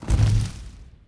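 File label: tree slide large; objects; Star Wars Obi-Wan; XBOX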